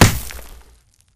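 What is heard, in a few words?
Bullet Light Ballistic Dirt impact; Titanfall 2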